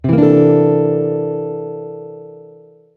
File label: C dom13